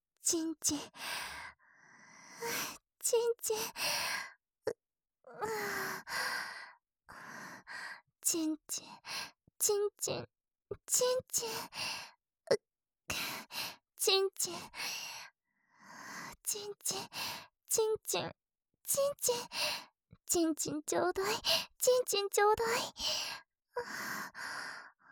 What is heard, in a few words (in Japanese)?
喘ぎ; ちんちん